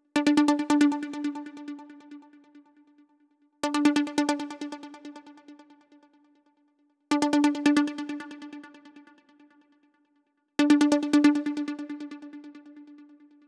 Plopper WET 138BPM, Melody & Synth; D minor; Vengeance Samples; VTS1 Nightfly Kit

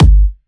Thai Kick, 126BPM; Vengeance Samples

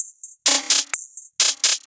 House loops 128BPM; Vengeance Samples

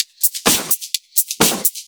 Electro Loop 128BPM; Vengeance Samples

